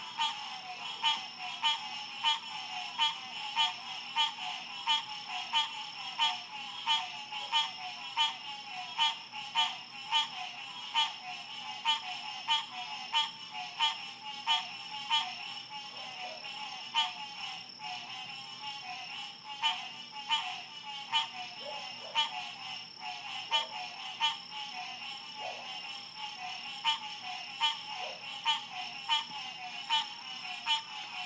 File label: tree frogs in sc